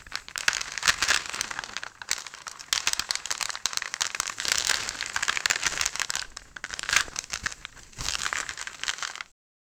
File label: aluminum foil crumpling